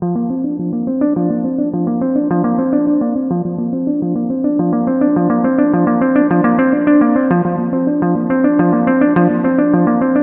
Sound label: Guitar